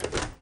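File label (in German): klappfenster open; volvo 9900; Freyfurt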